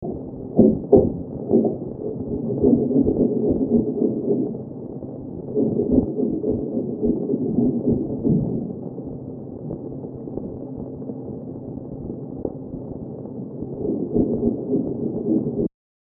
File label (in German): fleisch anbraten